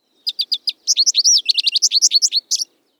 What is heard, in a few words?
American Goldfinch Song Continuous